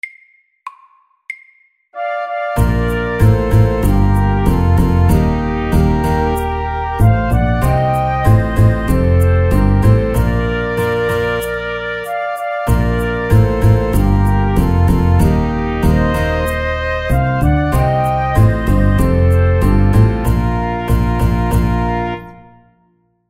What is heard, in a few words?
Mariachi band SAKTE